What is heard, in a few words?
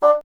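wind bassoon; Miroslav Vitous - Solo Instruments VOL-1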